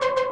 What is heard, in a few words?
synth; osu